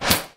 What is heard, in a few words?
arrow, skill; Project-AI-Chronicle